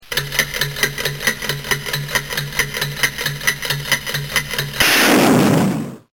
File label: time bomb